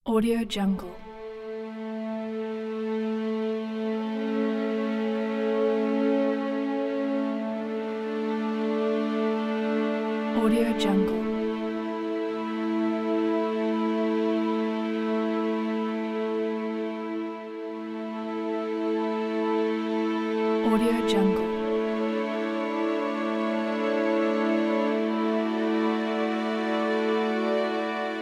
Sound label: emotional